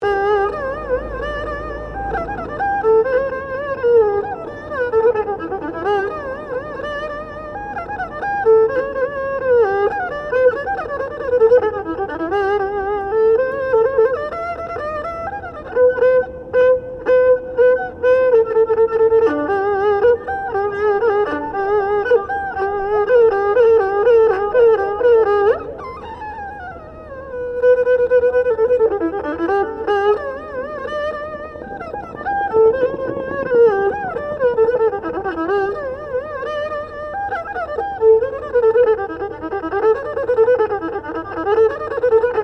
Ambience Melbourne Busker Chinese Erhu binaural stereo, Busker playing Chinese Erhu; Concerts